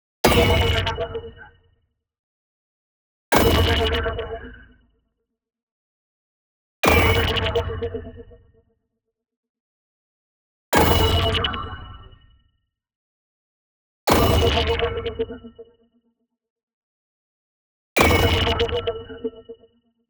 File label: neon hit small warped tremolos; boom library